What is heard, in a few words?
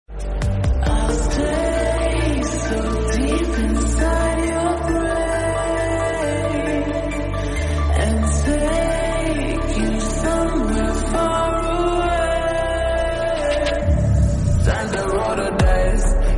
slowed reverb